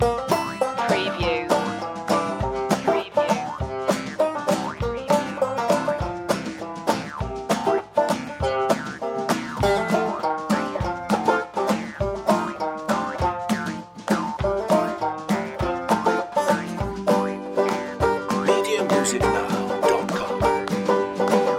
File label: stomp loop